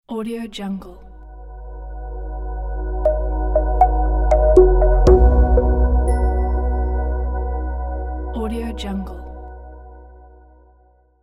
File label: mini melodic logo